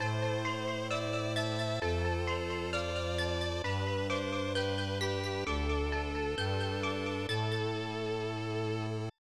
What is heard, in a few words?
Unused Jingle